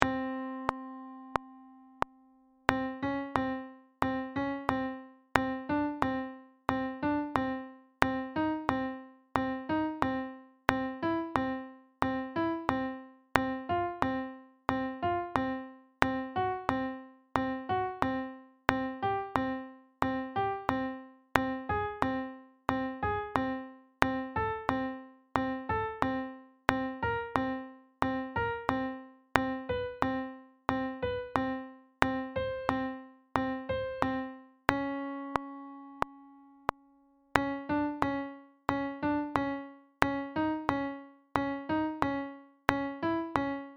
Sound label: pitch training slow